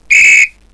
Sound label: ball Out